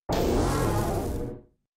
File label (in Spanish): Grito de Toxtricity forma grave